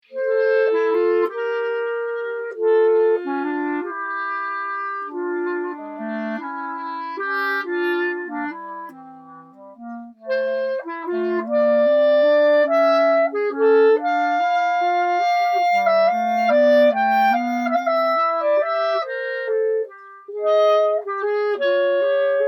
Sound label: dance suite waltz, clarinet